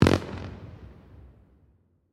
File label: Celebration Fireworks